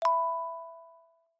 Navigation Generic Maneuver; iOS Sounds

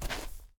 dirt step; Golden Crayon Sounds

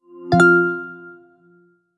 iPhone Air, iPhone Boot Chimes

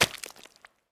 mud land; Golden Crayon Sounds

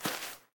grass step; Golden Crayon Sounds

